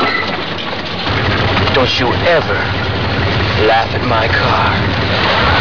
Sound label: car